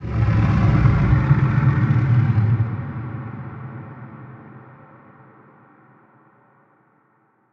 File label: unknown creatures big snake; Sfx amb unknowncreatures